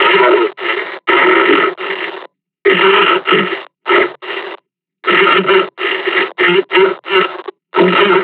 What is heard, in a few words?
breathing, combine charger; Half Life Alyx